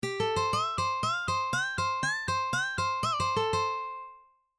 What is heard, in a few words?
D minor Tapping Exercise; Tapping Licks